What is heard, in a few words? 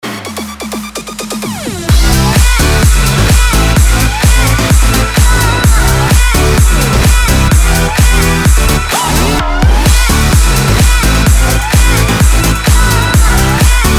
funk machine